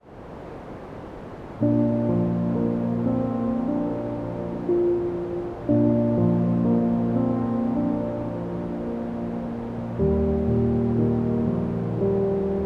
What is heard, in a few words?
piano, deep sleep white noise